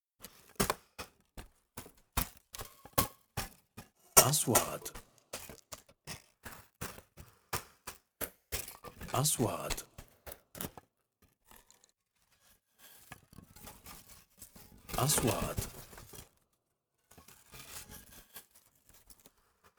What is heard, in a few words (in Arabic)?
حفر يدوي بأرض رملية; sound effect; رمال